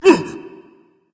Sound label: breath berserker; cuchulainn